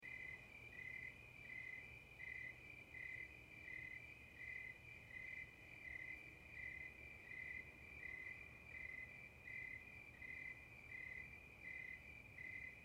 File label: Cricket Loop